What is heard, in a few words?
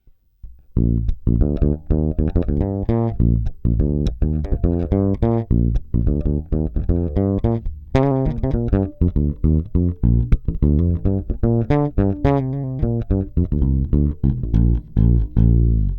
Fretless Bridge Pickup; Rheingold